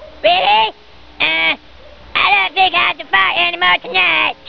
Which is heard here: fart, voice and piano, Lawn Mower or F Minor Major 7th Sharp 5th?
fart